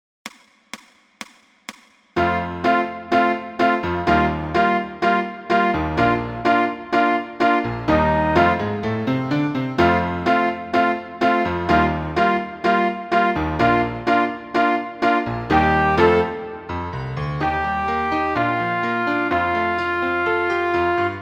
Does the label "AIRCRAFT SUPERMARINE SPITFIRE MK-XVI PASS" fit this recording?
No